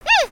Pets Bear Panda Angry; creatures pets; Lego

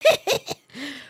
stink

